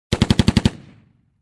Heavy machine gun short fire

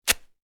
Velcro strap

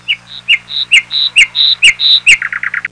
Lapwing chirping

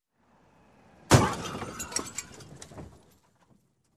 Car Crash with Scattered Debris Sound

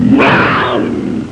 Jungle tiger roar

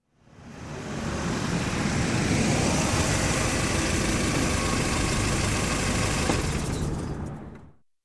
Car approaching and engine shutoff

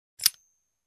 Clicky gun reload

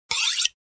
Knife Sharpening

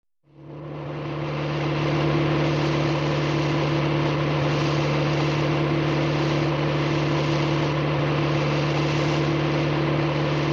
Car Engine Noise

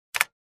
Keyboard key click

